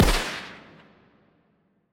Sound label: shotgun firing